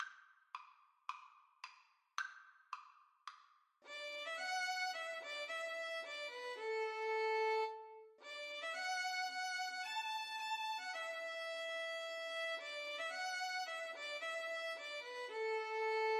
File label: violin duet